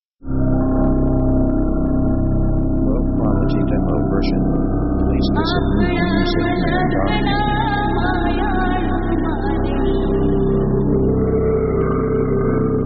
magician; synth themes

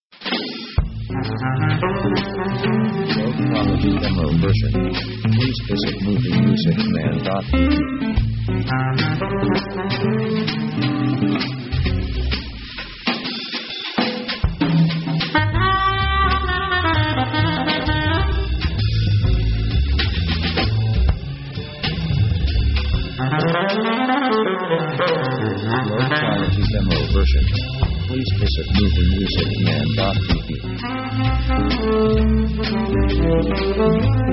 JAZZ WALKING; jazz themes

